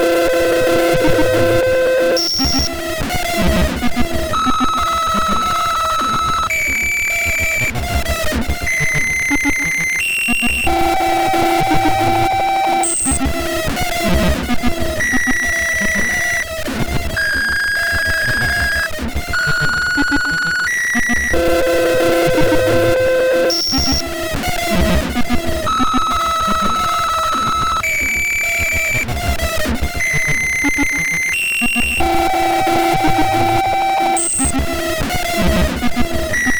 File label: corporate medical test testing